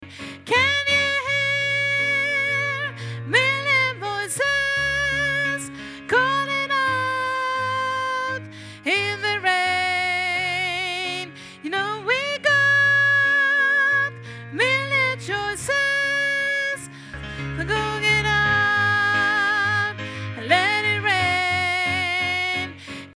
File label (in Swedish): bas